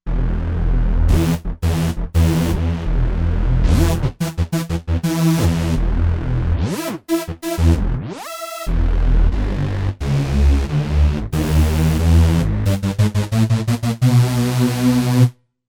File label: Nu RnB Saw